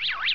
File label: pod distress